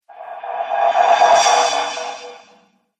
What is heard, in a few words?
Reverse Cymbal Whoosh